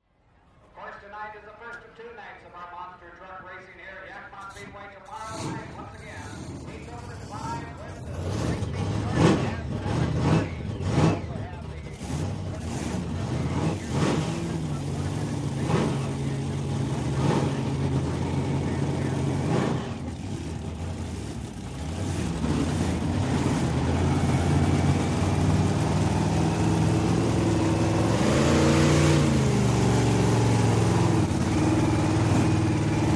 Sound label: Monster Trucks; Intro Ambience, Pa, Crowds, Monster Truck Starting Up, Idling, Revving, Then Drives Away, Revs And Shuts Off, Crowd Cheers